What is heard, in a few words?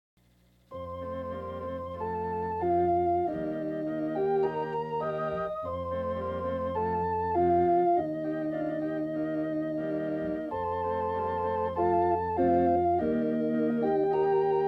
Pensive Prelude